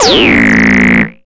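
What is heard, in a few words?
FX left, attacks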